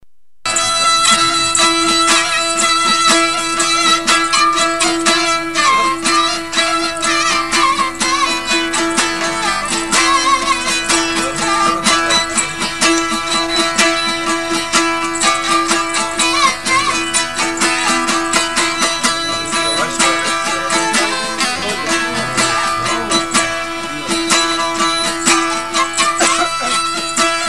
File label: classic balochi